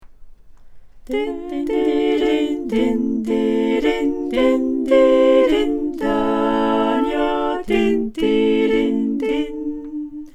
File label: Tutti